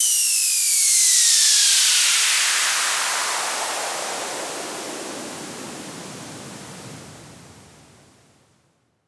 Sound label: Soft Fall, Dubstep FX Hits; Oneshots; Serious Dubstep